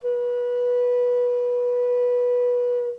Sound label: C5 baroque, flute